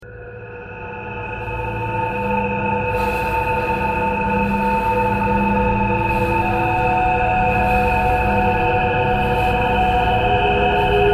traffic core birds thread pentatonic

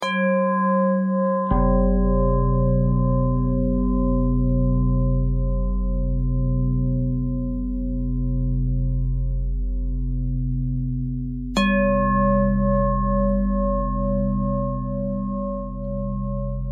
bell thread bend thread

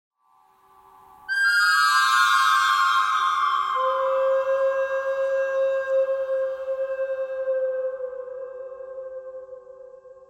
bird cry thread

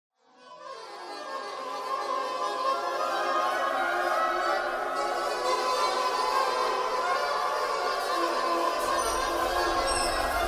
clarinet jazz thread noise; clarinet geese

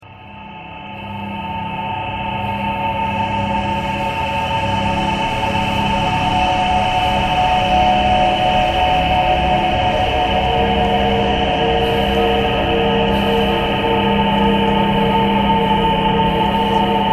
thread harmonic

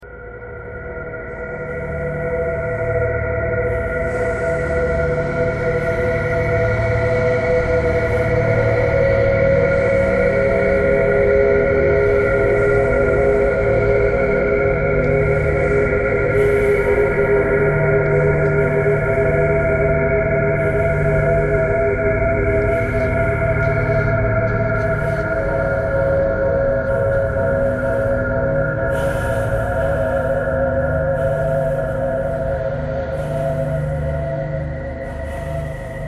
thread pentatonic

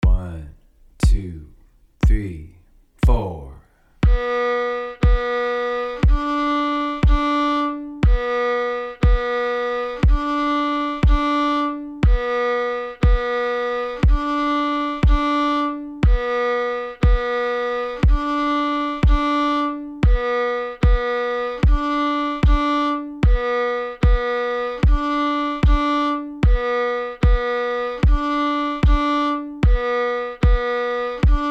Intervals

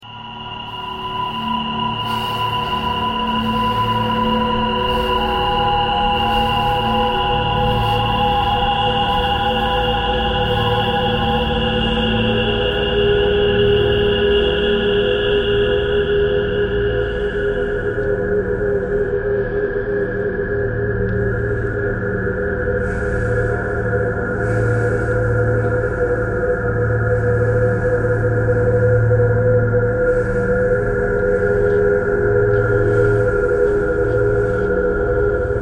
traffic core birds thread simple

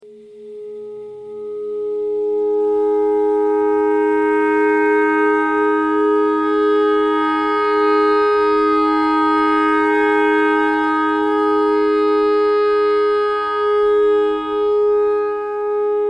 clarinet clarinet notes e thread pentatonic

